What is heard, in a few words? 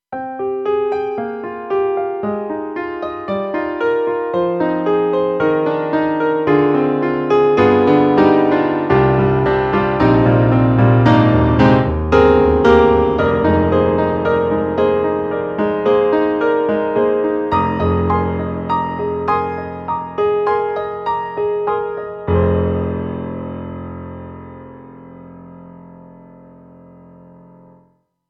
Korg M3 Stereo Pro Piano; XPanded